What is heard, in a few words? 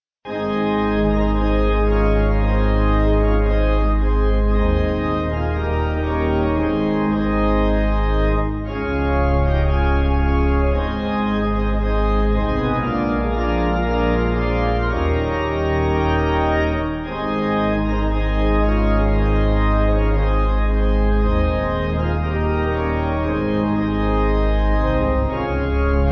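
Pipe Organ, Music Backing Files for Churches; C.A Miles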